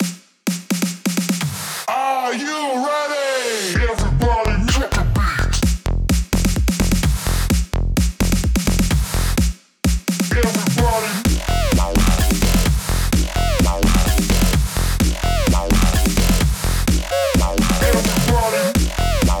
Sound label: Beat